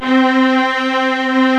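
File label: arcato string; OMI - Universe of Sounds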